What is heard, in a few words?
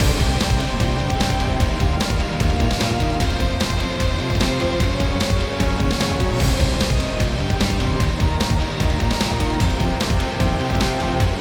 Music Boss; Robbie Swifthand and the Orb of Mysteries; PS4